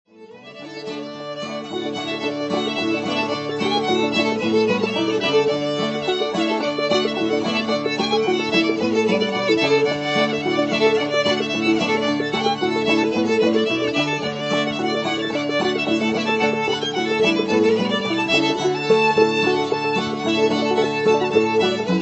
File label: Fiddle, Banjo, Guitar, Key of D; Ducks on the Mill Pond; Minori Dulcimer & Autoharp Festival; Old-Time JAM